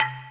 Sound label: DING C5